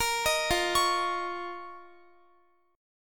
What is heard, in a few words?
F 7th Suspended 4th Sharp 5th, Guitar; F7sus4#5; x,x,20,20,17,20; 4E,4A,5D,5G,5C,6F; arpeggiated